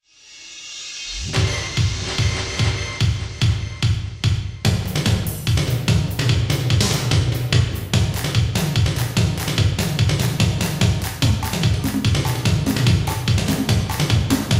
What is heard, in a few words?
Crazy Drums